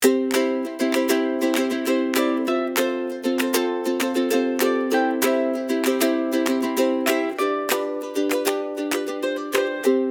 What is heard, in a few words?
Upbeat good time brightside days